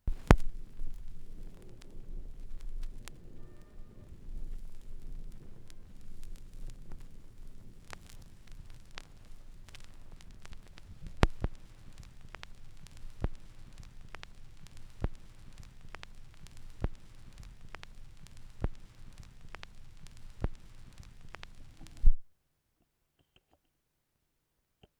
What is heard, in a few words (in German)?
schallplattengeraeusche